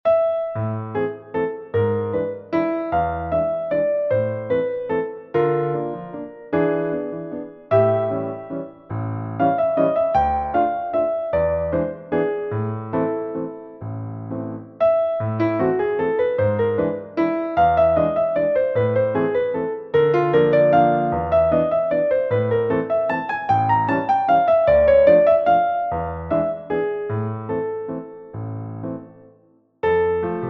waltz in a minor

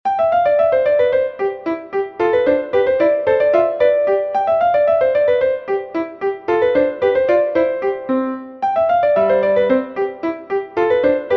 Etude No 1

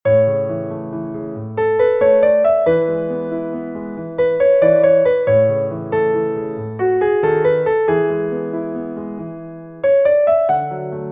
Arpeggio Etude

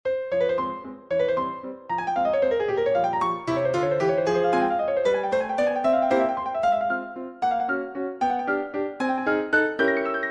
Three Pieces for Piano II. Agitato

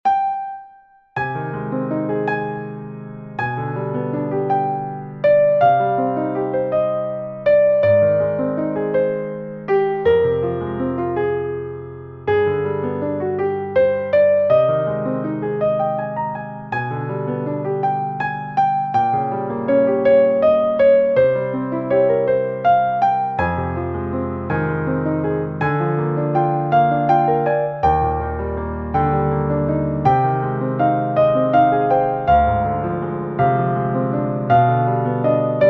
Song without Words; Two Romantic Etudes No 1

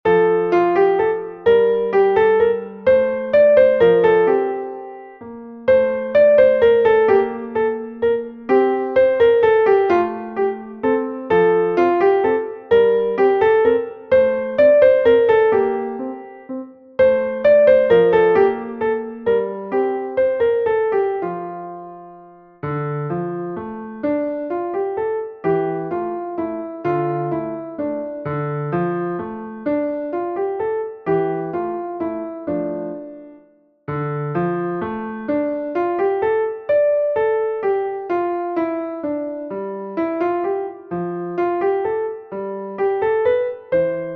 Minuet; Dances Songs no 3

